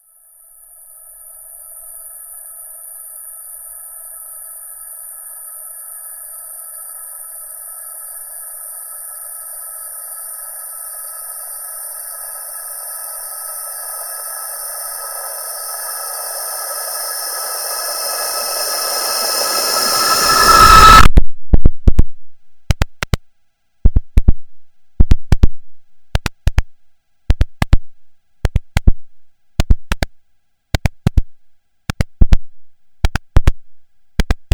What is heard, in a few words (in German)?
ice tonaufnahme; klaus osterwald